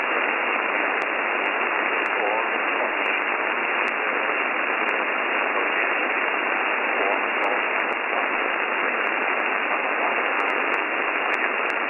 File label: Combined SSB; Bypass